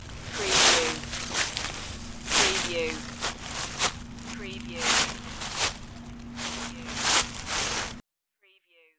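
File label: Leaf Search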